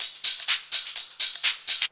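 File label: drum loop 125, hh+filterloops; Vocal House; PS-28 Vocal House Samples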